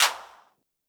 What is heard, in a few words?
happy clapper; Polyend; Legowelt